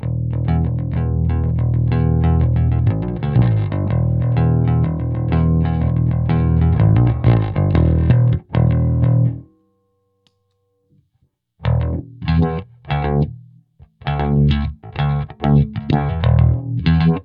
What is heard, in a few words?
Bass